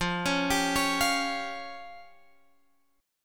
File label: F Suspended 2nd Sharp 5th F down Guitar Drop D